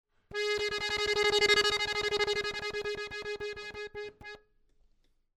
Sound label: repetition real